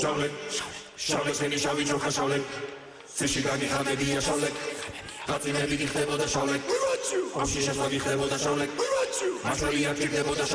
Cool Georgian Remix

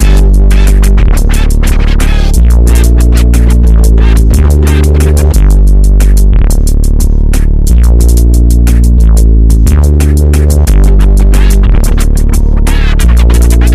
Bass Everything

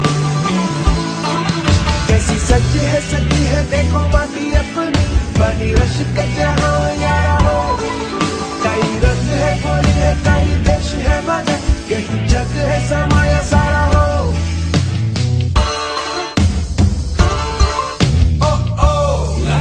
Guitar Tone

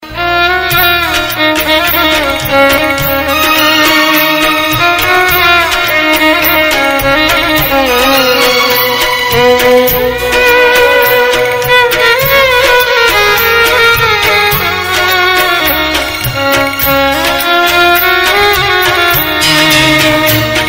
Sad Music

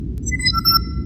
Door Chime; TNG

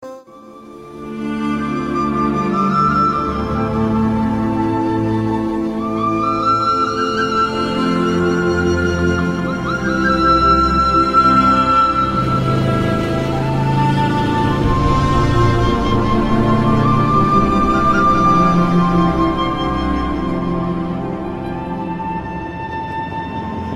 Flute

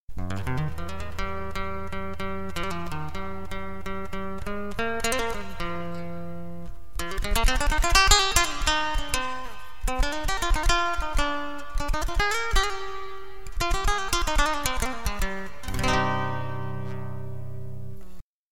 Gutar

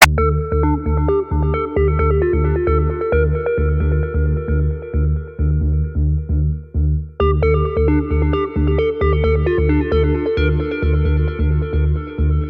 Sms

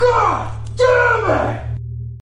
Sneaking

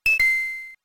correct; mario rpg

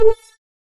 OOf

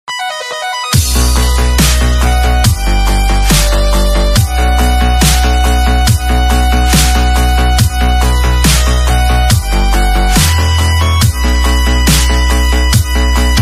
Dubstep; Panem Anthem

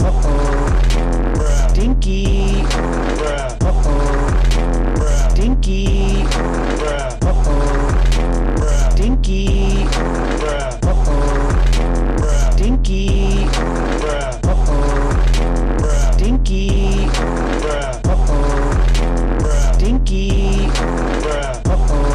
Stinky Beat

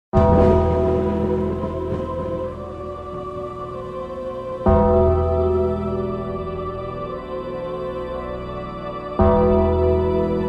Bells

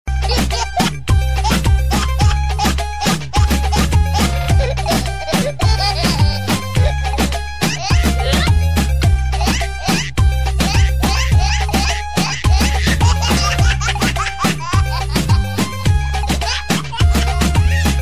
Baby

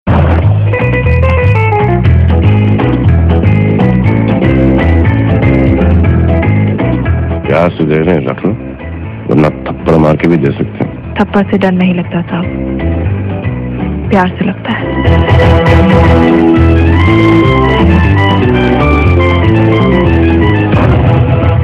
Romantic; Dabangg

